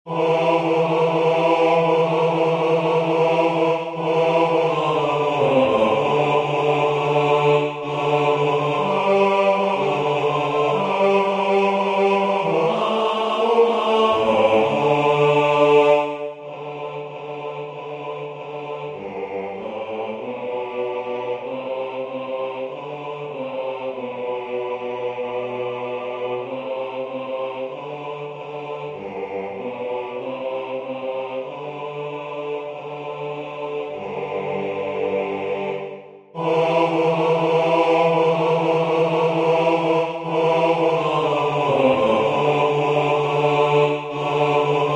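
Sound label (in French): basse, Musique sacrée; Mages suivons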